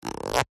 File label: household comb fingernail run across teeth